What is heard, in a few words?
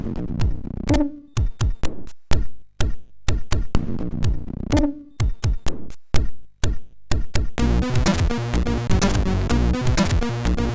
Strange Beat, sid MOS6581